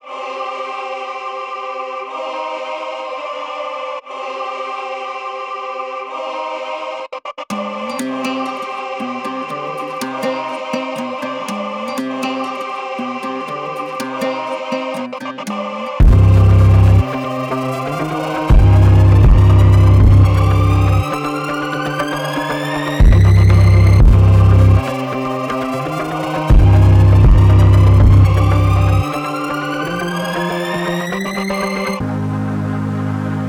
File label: house edm intro